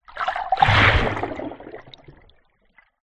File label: nature water underwater small splash hydrophone; zapsplat